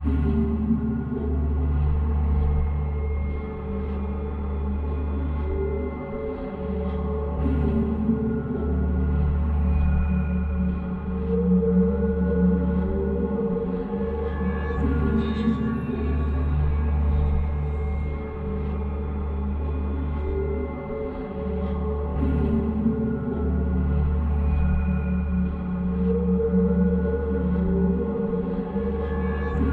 Drone, Light

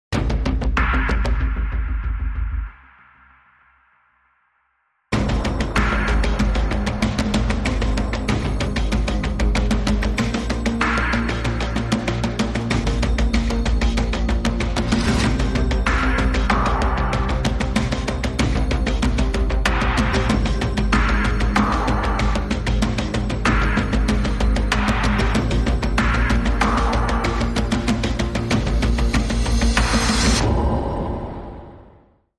No Piano

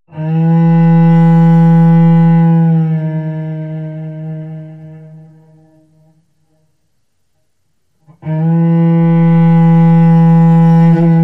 Cello Whale Song

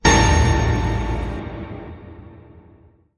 Jump Scare Hit

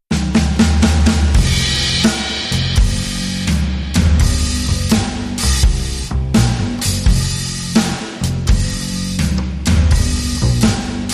Drum & Bass

